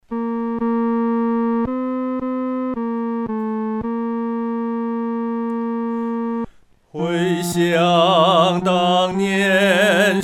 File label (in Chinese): 独唱 第三声